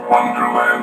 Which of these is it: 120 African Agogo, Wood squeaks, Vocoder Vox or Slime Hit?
Vocoder Vox